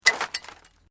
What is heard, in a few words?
Metal Light Movement Minor; Materials